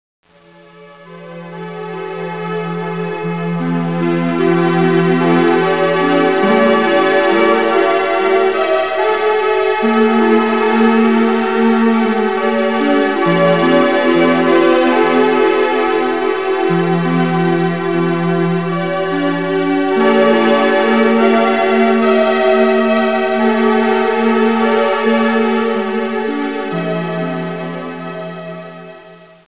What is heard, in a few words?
synth